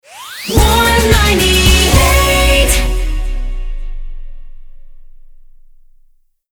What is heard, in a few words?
jingle rapid fire; Warm 98; 110bpm